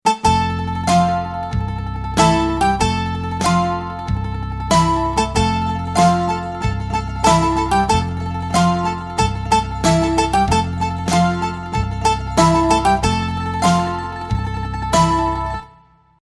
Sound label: greek logo